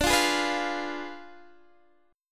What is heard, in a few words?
D Diminished 7th D, Guitar, Open D